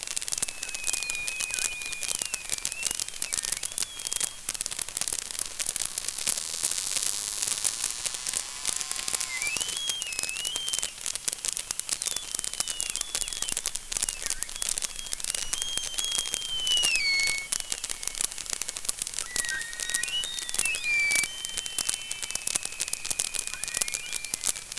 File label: whistles and rattles